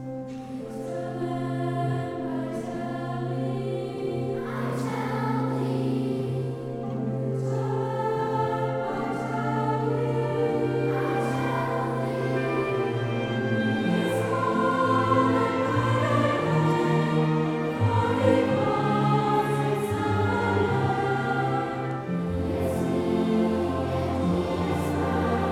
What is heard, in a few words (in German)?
Orchester Chor und U Chor Weihnachtskonzert